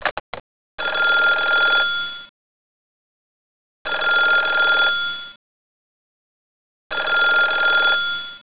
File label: phone